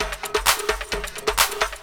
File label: drum 130, techno trance drumloops